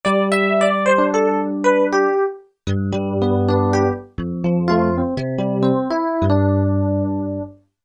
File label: 2 voices; Korg MSC-12S Synth 3